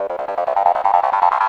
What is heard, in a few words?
gro 160, Hardcore Loops, Arpeggios; Dance Synths